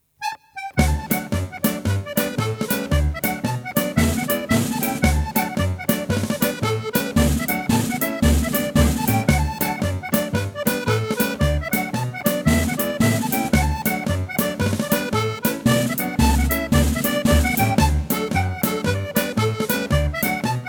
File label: Jigs, ceilidh band; Oran Mor